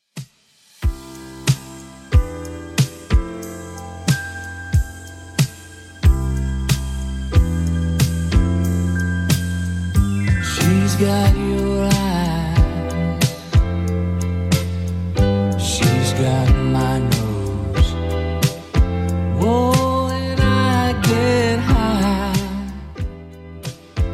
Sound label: 70s Redrum 92bpm